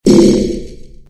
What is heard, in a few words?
bat smash